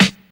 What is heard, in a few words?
clean steel snare drum g key; snare drum samples